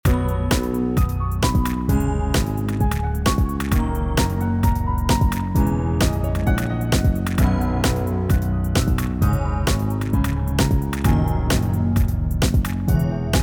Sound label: mix front to back piano dynamics; 加混响和扩展器的钢琴; C1作为扩展器; 这个声音已经带有目前所添加的全部效果了; Waves